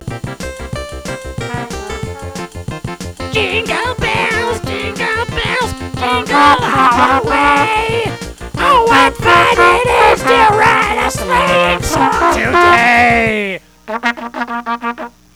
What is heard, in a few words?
Jingle Bells